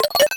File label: Melody Sound